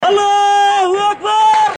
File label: charge, Voices Emotes